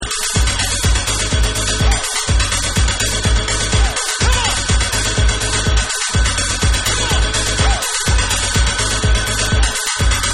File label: Techno Trance